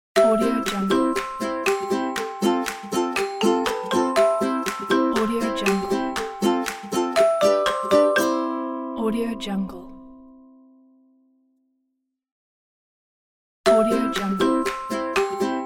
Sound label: happy ukulele intro logo